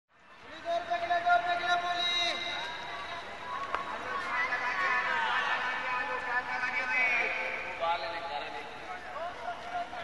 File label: Bengali Song